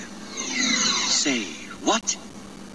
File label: pain; openffa